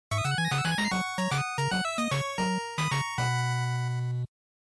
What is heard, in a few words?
Action, Clear; NES; bgm